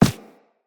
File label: Run Default, Footsteps; Characters; Operator